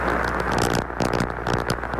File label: Substation 60Hz Partial Discharge Sound